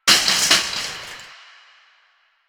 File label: Shopping Cart Hit